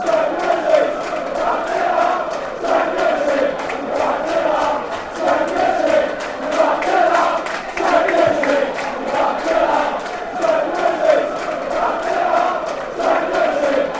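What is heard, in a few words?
championship yer having laff, football chants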